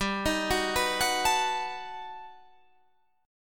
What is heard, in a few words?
G Augmented Major 9th